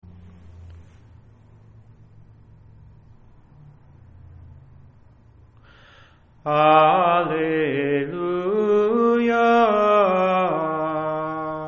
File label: Alleluia Psalmtone, sacred chant